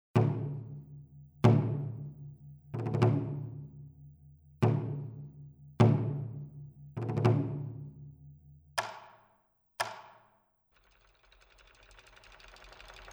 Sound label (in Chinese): zhangu